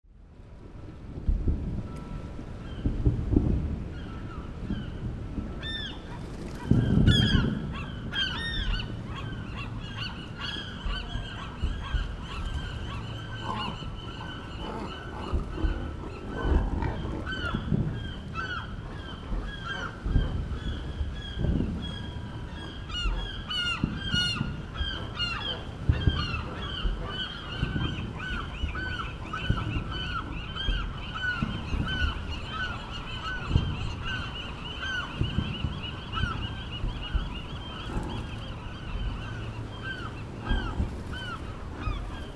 Alcatraz cormorants

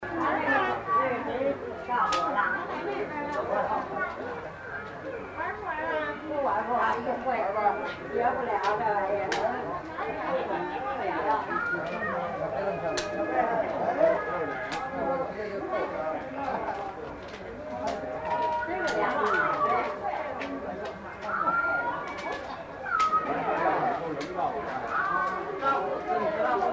mahjong

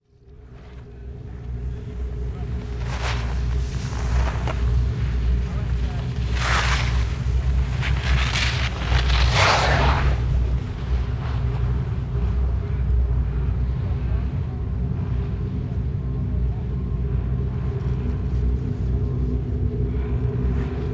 thredbo chairlift

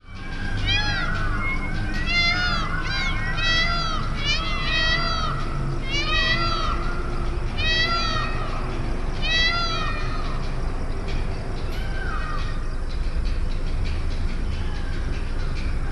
peacocks

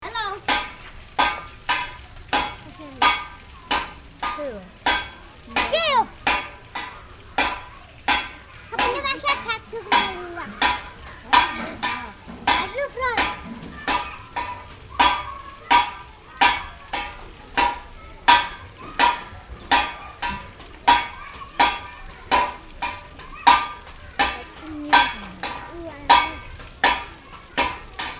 metalworking

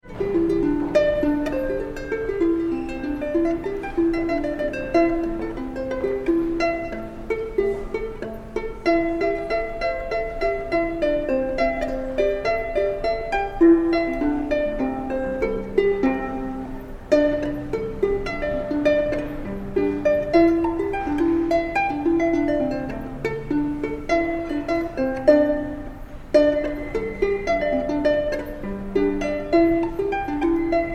pluck

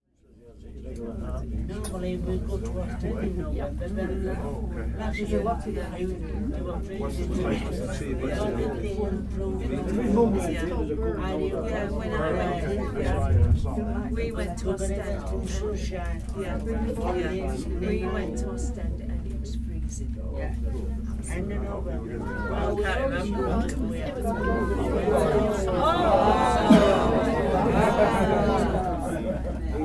Swiss Alps Schilthorn Cableway

